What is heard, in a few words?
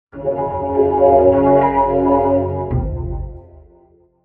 in Temple Vocoder